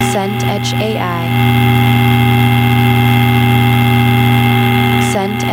scifi shield generator

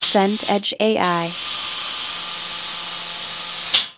scifi airlock cycle

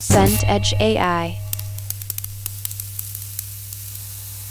fire ember settle

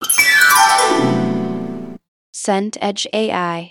harp gliss down

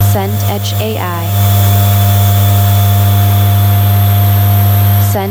scifi cryo chamber

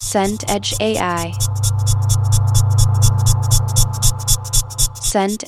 boat hull water

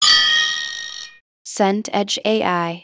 glass clink toast

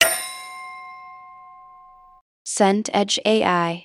metal ring long

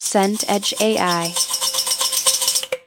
whisk bowl metal

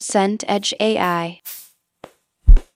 footsteps sneakers

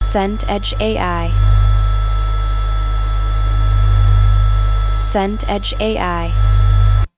bed aircon

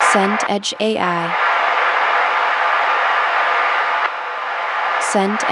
radio static AM

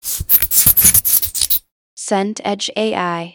hand rub

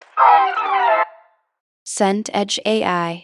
organ stab

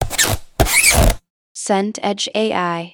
zip fast

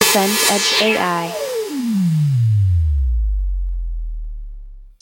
sweep down slow

